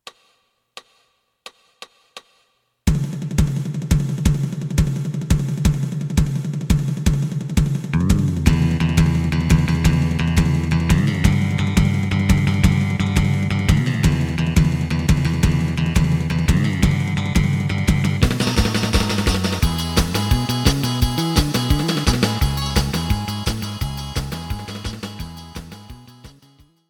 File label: driving guitar lead; リード(-1); エレキバンド用カラオケ